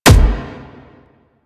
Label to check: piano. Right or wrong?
Wrong